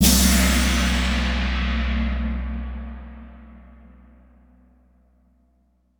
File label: Orchestral Cymbal Hit, гонг